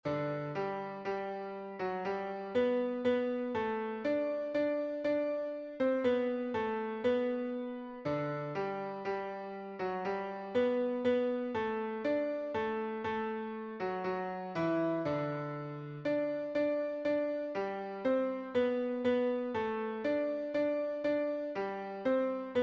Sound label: Piano; Hark the Herald angels sing